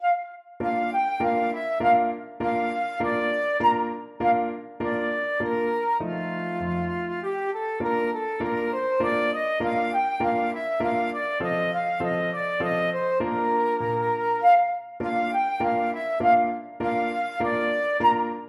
dance flute

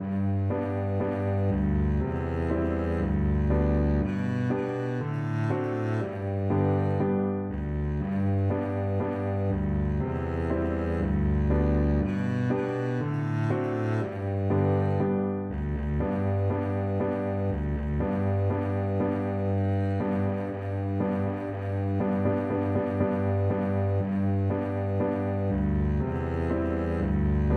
old macdonald, double bass

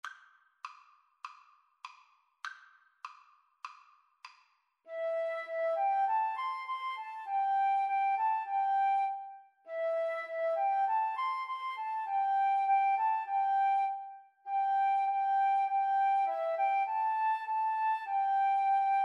jasmine flower, Flute Duet

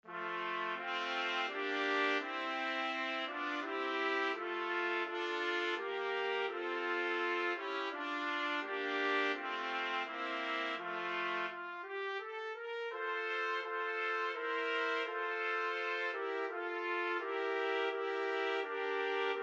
my country tis of thee, trumpet trio

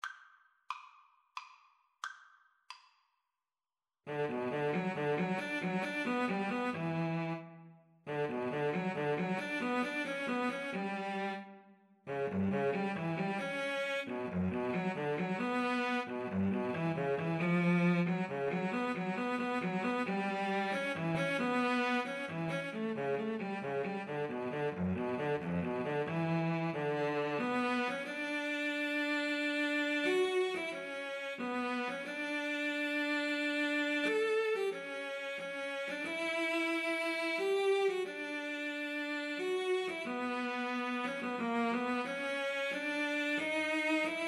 Violin Cello Duet; brahms sym3 mvt3

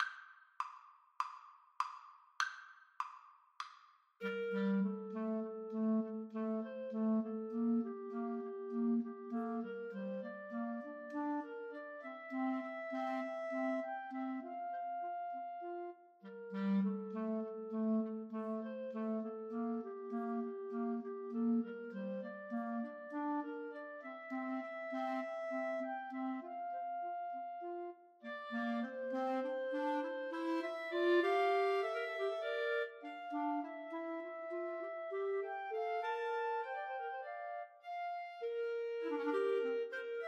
Clarinet trio

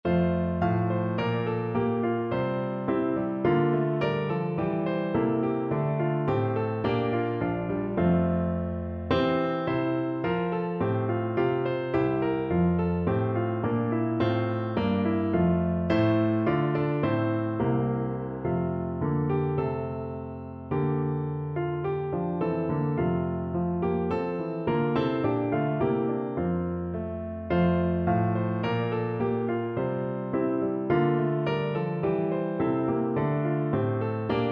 Bassoon

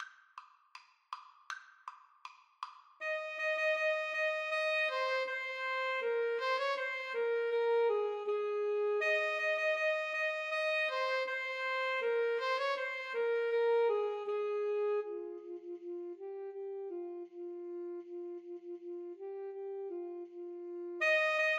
Alto Saxophone Duet

